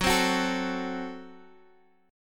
F sharp Diminished Guitar Half Step Down

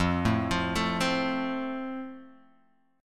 F Major, F Chord; arpeggiated

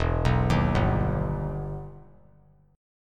E Minor Major 7th E down; EmM7 arpeggiated

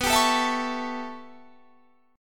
A 7th B, chord; A7/B; strummed

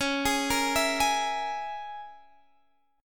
C sharp Minor 6th C sharp, Guitar Standard; C#m6; arpeggiated; JGuitar

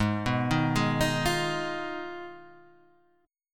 F Minor 7th Flat 5th G sharp, Guitar; Fm7b5/G# Chord {4 2 1 1 4 1} arpeggiated

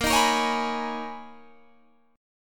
B Major 13th Bb, guitar

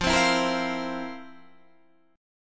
F Major 7th Suspended 4th F; strummed

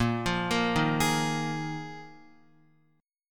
Bb 7th Suspended 4th Bb Guitar 4E,4A,5D,5G,5C,6F; arpeggiated; JGuitar

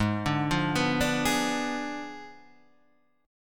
G sharp Diminished 7th G sharp, Guitar Standard; G#dim7 arpeggiated; JGuitar

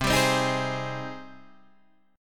Ab Major 7th, C

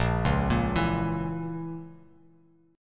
A sharp Diminished Bass Standard, chord; arpeggiated

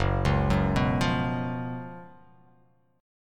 Gb Major 7th Suspended 4th Gb, guitar; GbM7sus4; arpeggiated; JGuitar

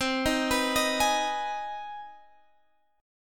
C Minor Major 7th Sharp 5th, Guitar, Standard; arpeggiated; JGuitar

